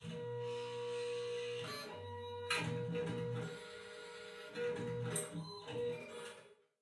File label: Office Scaner